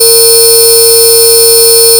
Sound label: fm voice single2; cosine; FFTimbre